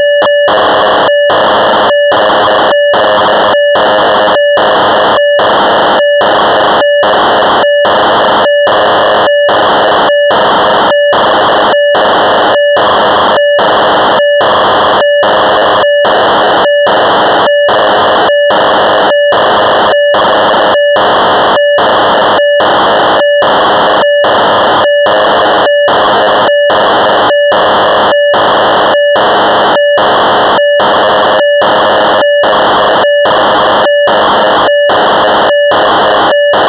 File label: Rhodes Chroma factory presets, Cassette Interface; Synthesizer Factory Patches